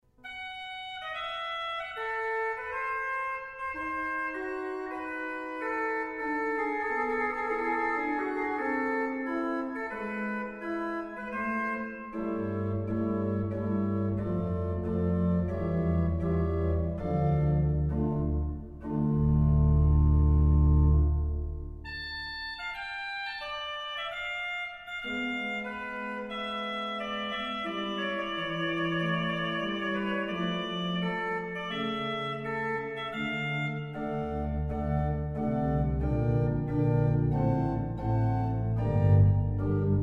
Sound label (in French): Torelli la mineur Adagio